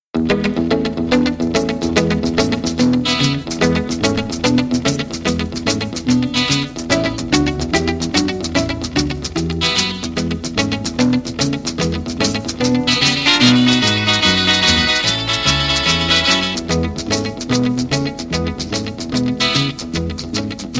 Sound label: boppin guitar